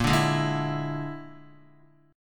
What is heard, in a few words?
Bb 7th Flat 5th Bb, Guitar; Bb7b5; strummed; JGuitar